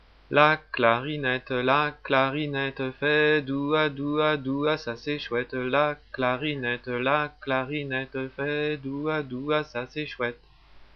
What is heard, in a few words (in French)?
clarinette